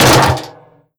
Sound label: vent step, headcrab reviver, creatures